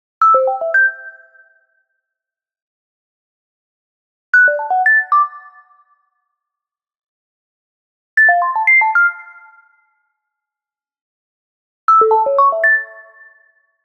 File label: Arcade Jingle Positive